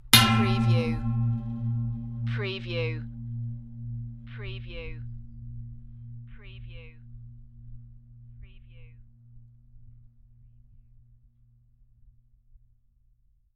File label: Metal Impact Hit - Gong Like With Long Ring